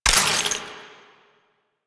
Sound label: Ammo Pickup Radiation; DE; Warframe; Warframes; Frumentarius; AmmoAbility